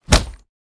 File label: slap; smite; odin